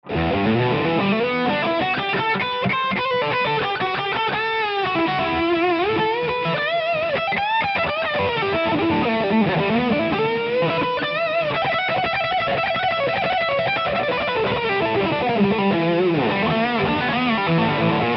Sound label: X3L Plexi Lead